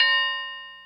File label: clanger, bells; Misc Percussion